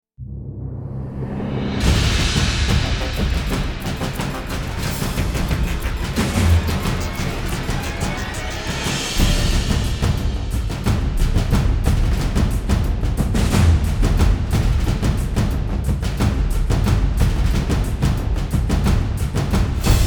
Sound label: percussion mix; megatrax